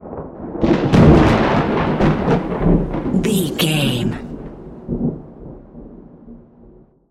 thunder lightning strike rumble

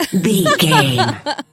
voice female laugh

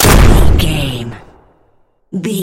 gun shotgun sawed off shot

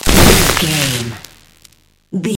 electric surge blast

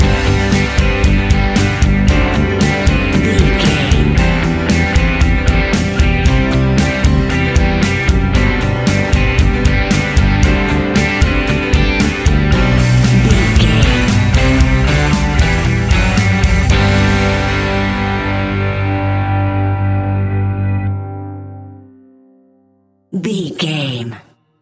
indie pop rock band; Indie Pop Rock Band 15 Sec

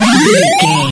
retro powerup collect

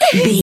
voice female hurt pain mild

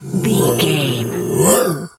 bear roar

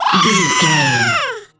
goblin fairy battle shout

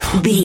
voice male breath quick

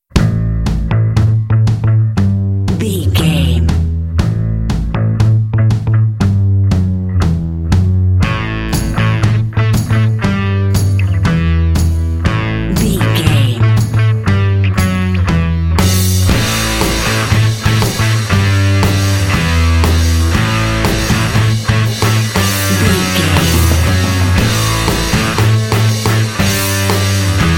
noise god bumper

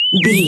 retro bird critter call squawk

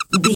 voice fun creature squirrel

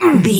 voice male hurt pain

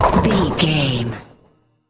bowling ball pin strike

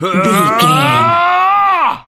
voice male battle shout

